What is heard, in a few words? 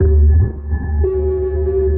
sonar Ping Suit Far Shuttle Directional; Barotrauma; Real Sonar